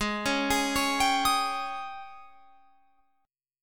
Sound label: Ab Major 7th Suspended 4th Ab Guitar Open F; AbM7sus4 arpeggiated; 20,20,20,20,22,22